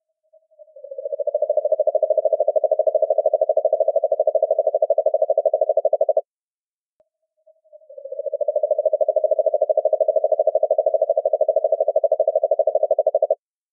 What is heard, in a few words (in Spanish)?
Sapo gigante